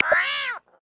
Nine-Banded Armadillo, Amazon; Xiaomi Redmi-Note-8-Pro

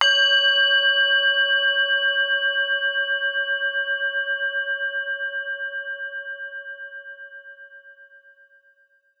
Resonant Alarm; Samsung; GT-I8320 Vodafone 360 H1; Ringtones

